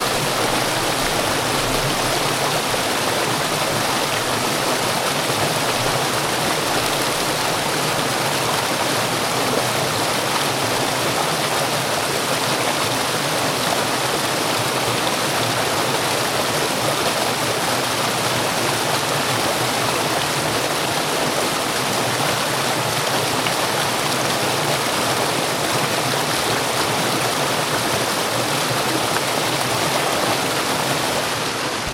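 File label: Russian nameless stream flowing through forest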